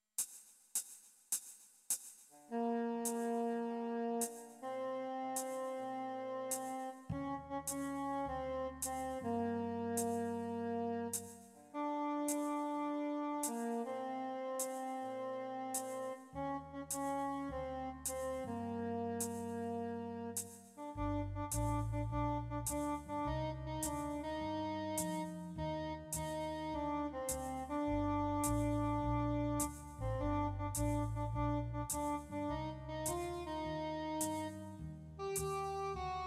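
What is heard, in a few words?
alto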